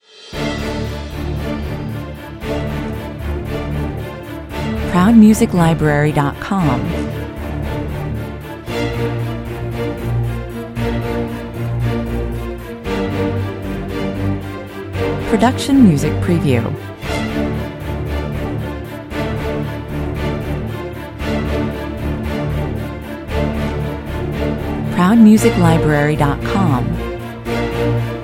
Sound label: orchestral mix high intensity